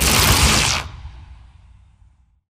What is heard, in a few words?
bullet energy titan arc; Titanfall 2